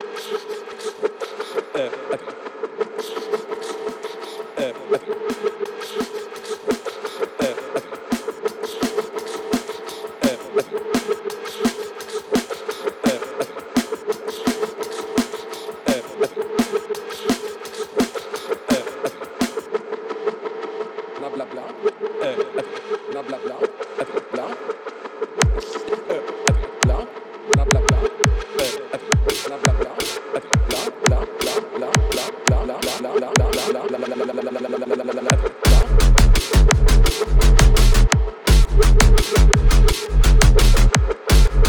drum and bless you im out

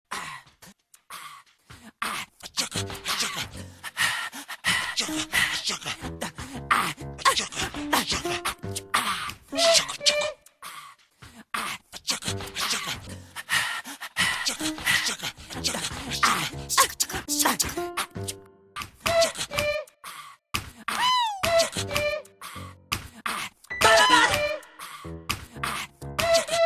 canada rap

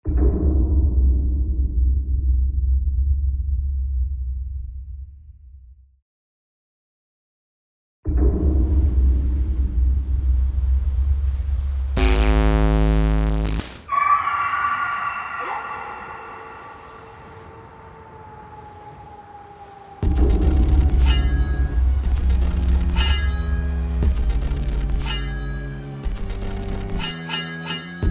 spooky beatz

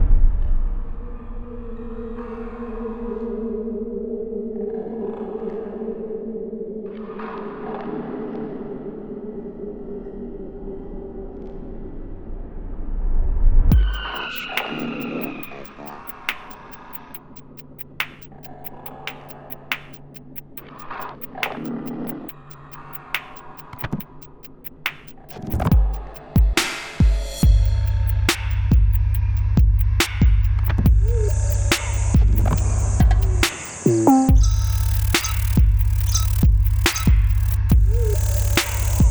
wood birdhouse; Digitaler Trommelkreis

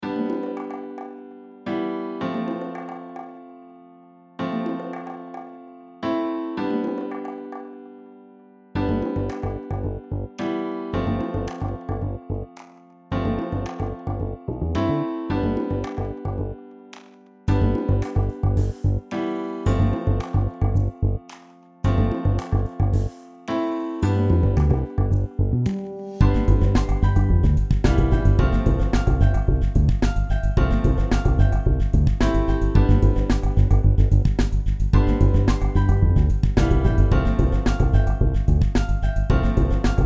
popfunkgoashuffle

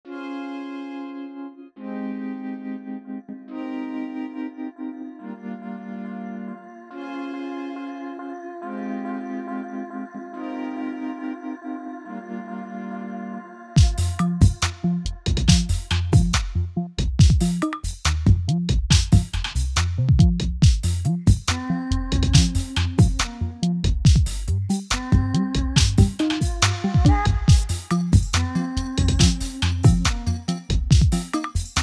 organ but wind

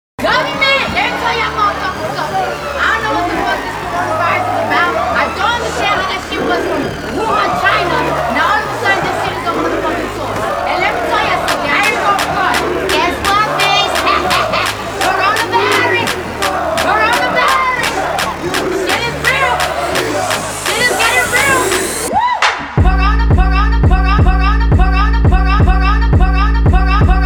funk; covid

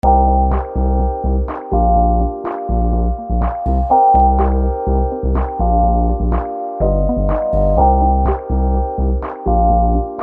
Beat Battle Type Beat

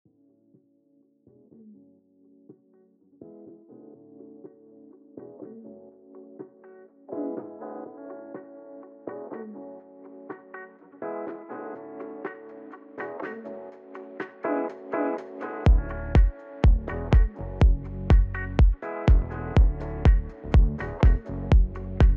French House Mix

